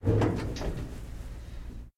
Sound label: Pilon Servo Start